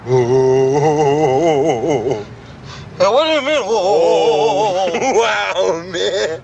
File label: hohoho